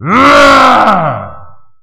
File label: Type F Atk; WarRock